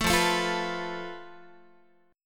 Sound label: F Major Flat 5th Guitar, chord; FMb5; 4A,4E,5A,5D,5G#,6C#; strummed